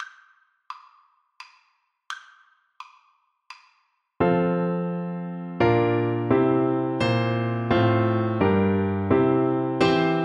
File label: bassoon